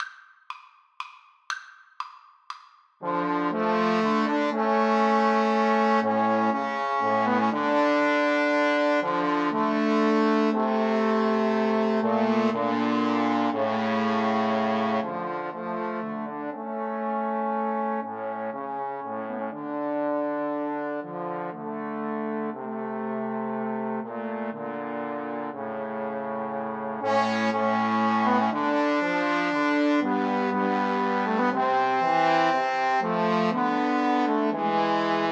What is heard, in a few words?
Trombone trio